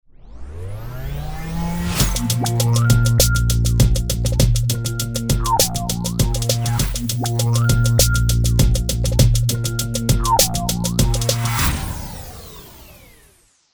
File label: Warbly Feeling